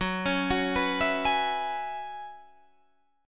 Gb 9th Suspended 4th Gb down Guitar Standard, chord; arpeggiated; capo=3